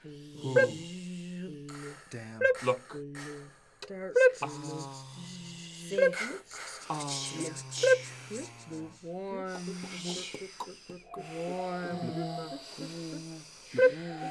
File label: Cave